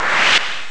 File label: kill blast; Amiga Soundtracker Sample Packs